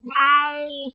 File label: meow D3